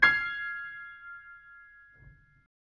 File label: Fm highest, chords